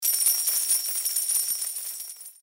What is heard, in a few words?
BONUS COIN FILL; slots; Magic Coin